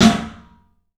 CLUB SNARE; 3D Sound; 3D Drums

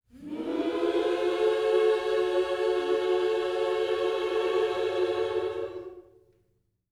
MM MINOR, Gospel; Spectrasonics; Vocal Planet; MIN. SCOOPS

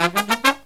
Brass Riffs

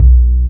Jaz Bass; Equipped Music

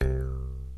JEW'S HARP

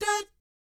DAT G#4, Vocal Planet Jazz & FX; ILIO; JAZZCOMBO

